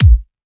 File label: Kick, 909 Kicks